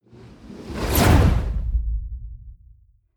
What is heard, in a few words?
whoosh fire zap flutter